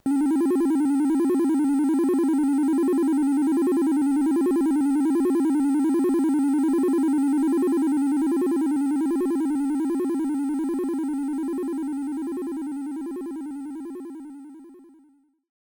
In-Game Ambience; Phozon; arcade

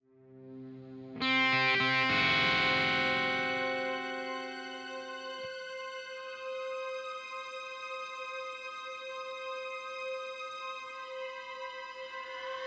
Slaughter music

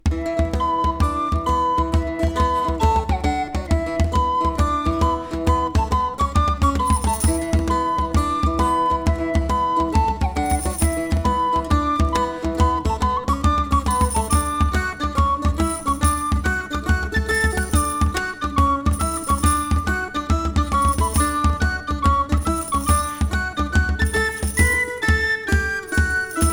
Upbeat Morris dance; anno 1503 gamerip

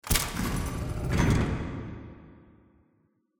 paint bucket insert

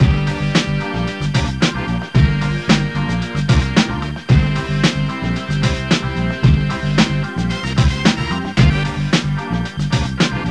Urban Style

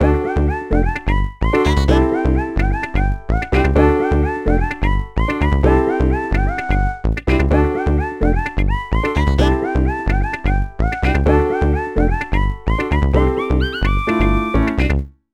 Punky Funky

